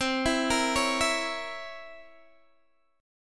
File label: C 7th Flat 9th C Guitar Open D